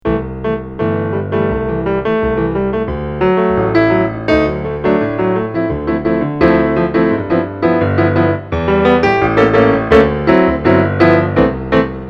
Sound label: bossa; ladinatantsud